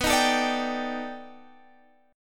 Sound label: B 7th Sharp 5th Sharp 9th, guitar; strummed